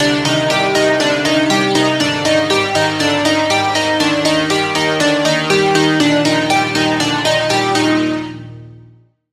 Key, Serum 2; Key - Sweetheart; Routed Sound; Serum 2 Expansion - Reality Maker Vol.1

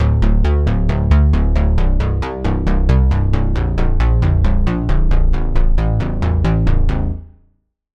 Bass, Sylenth1

